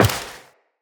Step Metal, Footsteps, Walk; Characters; Tenno

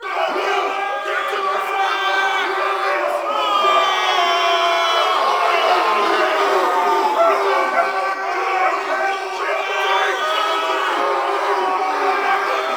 Battle Walla Loop; Makvoss Cannon

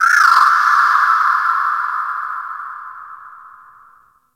Ocean Underwater Critter Small; Warframe; Grineer Ocean

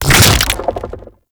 Head Spin; Warframe; ManInTheWall; Octopede

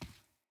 Boot Walk Default, Footsteps; Enemy; Sinecure; Melee Grunt; Boots

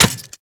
Worker Metal Leg Step Default; Warframe; Characters; Solaris

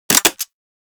Infested Vomit Gun Mech; Warframe

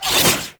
Whoosh, weapons; Broken Frame Warfan

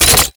Ink Rifle Mech End; Warframe; Tenno

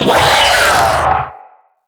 Spider Vocal Attack Short; Vocals; Root Spider Mother

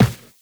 Run Default, Footsteps; Warframe; Tenno

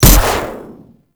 Electro Mag Trigger Explode A, Corpus weapons; Warframe

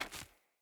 Walk Stone, Footsteps; Characters; Mother

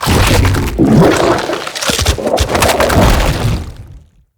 Struggle Right Head Fail; Corrupted Bromius

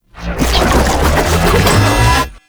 Medic Aoe Wave Cast; Abilities; Warframe; Tau; Dax Medic

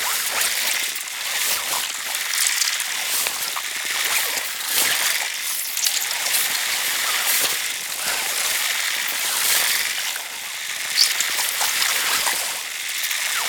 Wall Climb Dodge Tech Loop; Warframe; Oraxia

